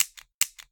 tazer clicks; Apotheorasis

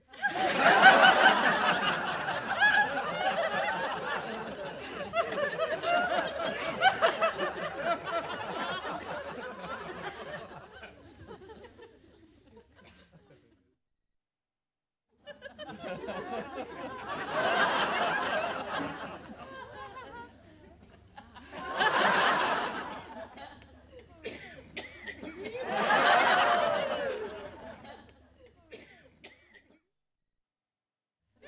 Laughter studio audience; Interiors With People

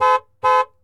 Truck Durango Horn; Trucks

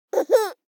BABIES LAUGH Boy 12 months Very Short; Baby Boom

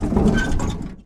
car rattle soft; Blind Drive

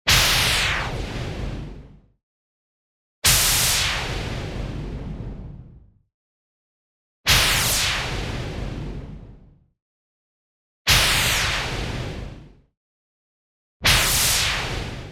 IMPACT WHITE NOISE Filtered Noise Simple Zappy; boom library; Anime Essentials

